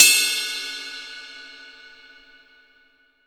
22INCH ZIL RIDE, Ride Cymbal

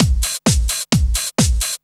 straight, Techno Trance Essentials drum loops

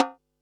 snaredrum, Pearl SC-40

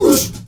MALE WAR, Heart of Africa, shout

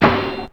Snare, Ensoniq ASR-X Pro

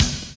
DOUBLE BD; Univers Sons

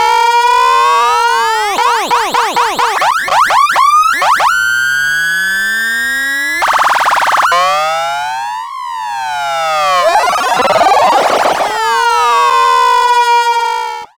Industrial

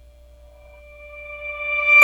FARFISA ORGAN, D3; Sonic Reality; Vintage Timetraveler

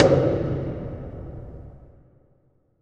WATERTANK, Impacts, Hits & Booms; Distored Reality 1&2

